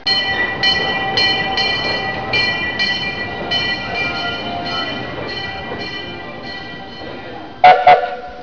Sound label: railroad station